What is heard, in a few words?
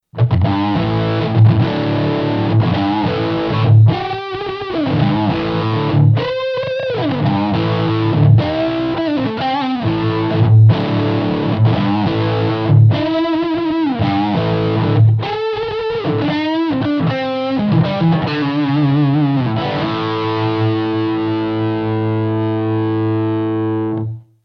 lead